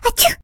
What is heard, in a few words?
combo break; Moyai Balls